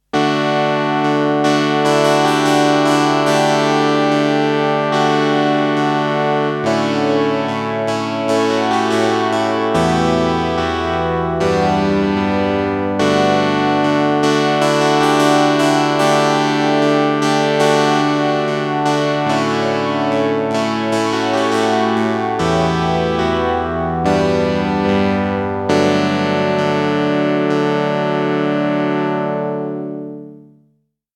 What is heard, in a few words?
subtle chorus